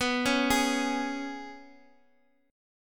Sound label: G sharp Minor Double Flat 5th, guitar